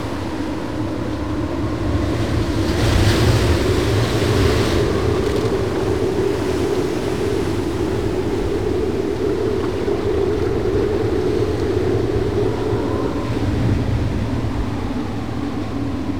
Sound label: Ambient